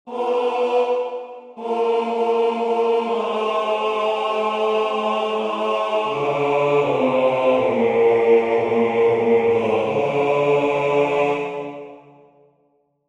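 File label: bass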